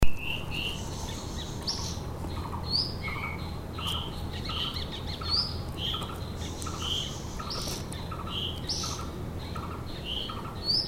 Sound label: flycatcher white tailed Cyornis concretus